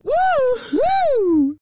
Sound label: Woman Woohoo; voice; Zomboid; Lifestyle